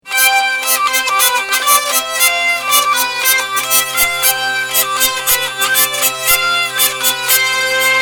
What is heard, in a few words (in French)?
vielle